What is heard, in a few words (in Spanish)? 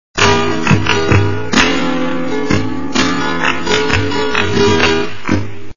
buleria SLOW